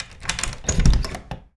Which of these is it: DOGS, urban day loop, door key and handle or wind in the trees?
door key and handle